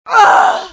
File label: oof